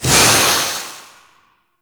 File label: Void Release Short; Weapons; Warframe; Duviri; Incarnon Adapters